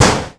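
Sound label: vehicle hit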